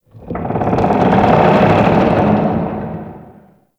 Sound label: low rock creak; Ambience; Warframe; Grineer Settlement